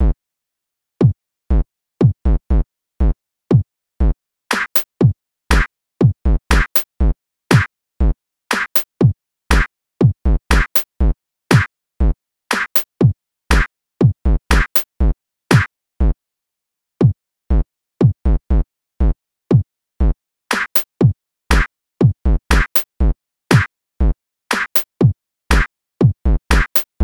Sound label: drum; hydrogen